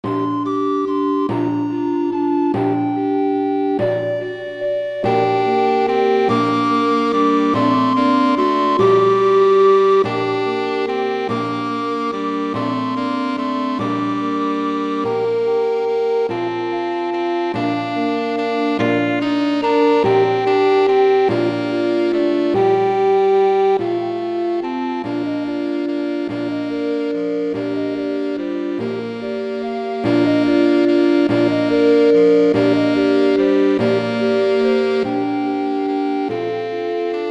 Saxophone Track with Melody; We3Kings

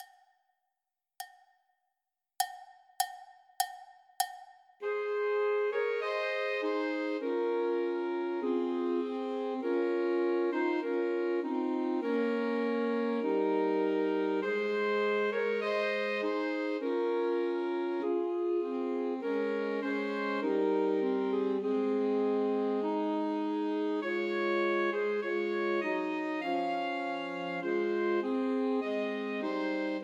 Trios for Three Alto Saxophones; In the Bleak Midwinter; Christmas

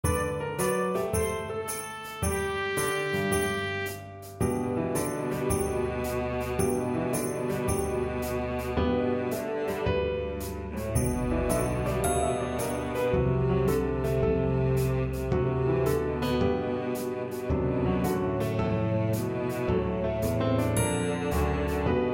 Cello Track with Melody; Jingle Bells

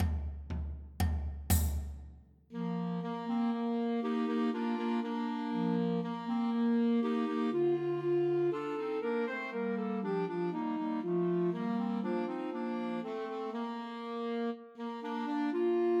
Trios for Two Clarinets and Alto Saxophone; The Holly ad the Ivy; Christmas

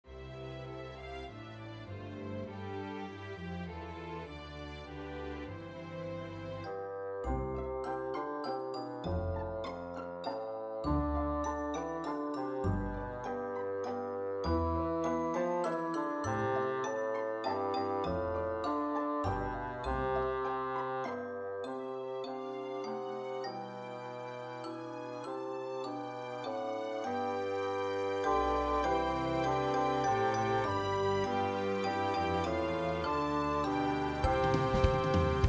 Bassoon Track with Melody; We Wish You A Merry Christmas

